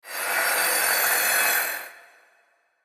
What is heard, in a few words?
sawmill; New Yankee in King Arthurs Court